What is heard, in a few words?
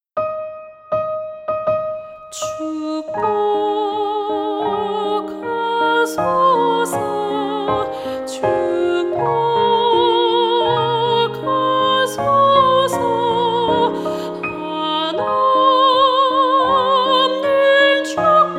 BASS